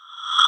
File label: close; Flashpoint 11.1 Infinity; BitMagic